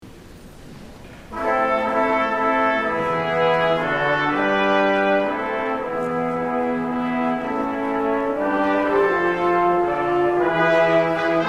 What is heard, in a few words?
Festival Brass